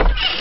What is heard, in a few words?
door; Undercroft